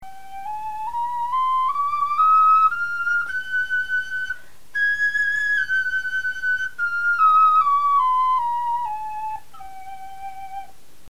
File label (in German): tonleiter, knochenfloeten